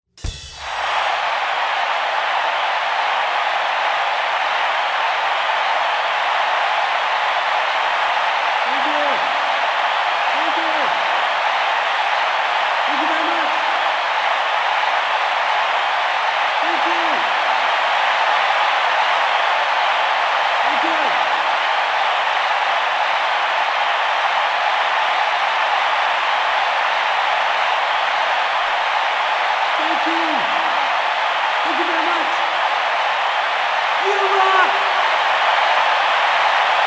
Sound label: The Applause